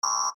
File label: error